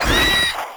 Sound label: Proto Infested Maggot Attack; Warframe